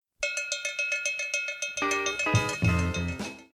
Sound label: Beat & percussion